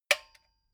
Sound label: metal button stereo spring click medium